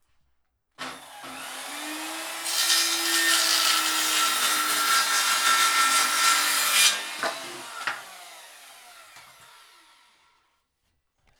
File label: wood cutting sawmill machine cutting prefab wood board